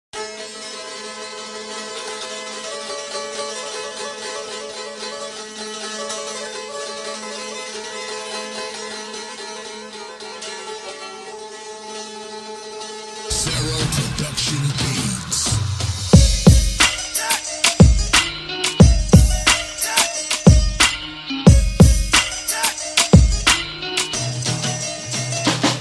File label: Cifteli Shqipe Hiphop